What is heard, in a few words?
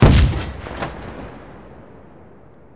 tank fire